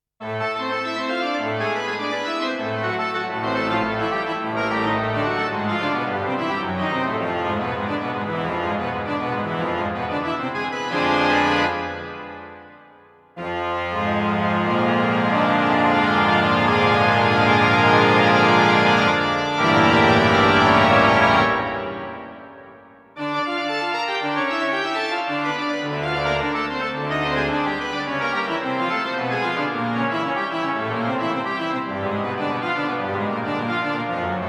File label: choral 3 in a min; franck